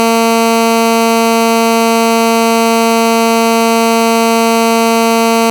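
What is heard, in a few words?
Oscillator Pulse 25pw 220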